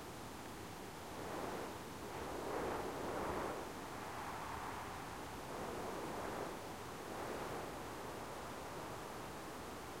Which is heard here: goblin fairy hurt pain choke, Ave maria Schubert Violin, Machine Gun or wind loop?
wind loop